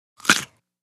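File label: Single Short Bite Carrot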